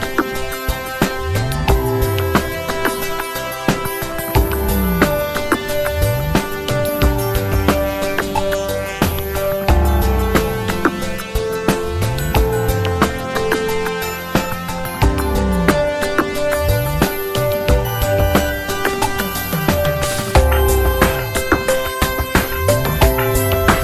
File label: Music